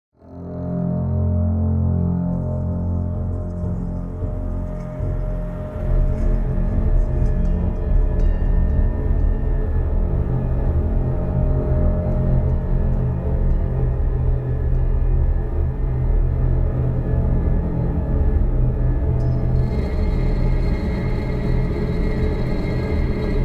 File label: Decay